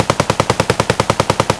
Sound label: akm far loop; Escape from Tarkov